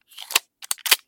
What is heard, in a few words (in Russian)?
spokojnaya netoroplivaya perezaryadka